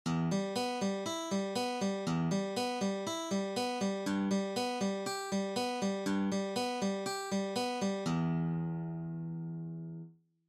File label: fingerpicking